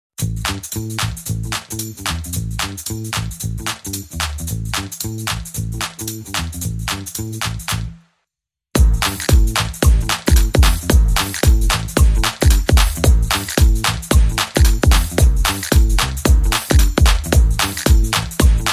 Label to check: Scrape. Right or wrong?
Wrong